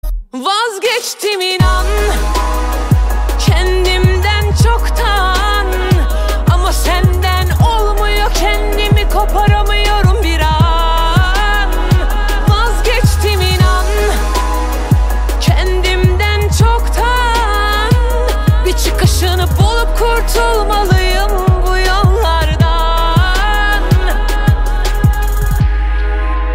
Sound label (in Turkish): zil sesi